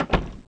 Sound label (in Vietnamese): dong cua tu gap gap